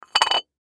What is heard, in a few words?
Clay Pigeon Down, Clay Pigeon Set Down; Miscellaneous Sports